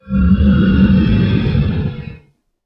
Bear Growl; Horror videos

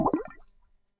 Bubble Burst Pop

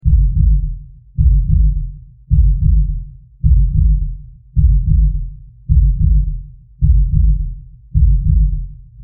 Slow Creepy Heartbeat, horror

